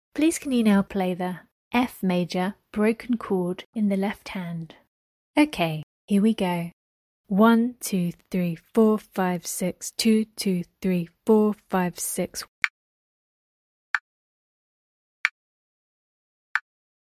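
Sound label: F major broken chord left hand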